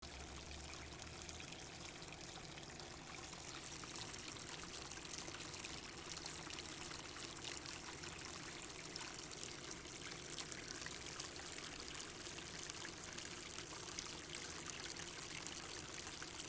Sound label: Jacuzzi Water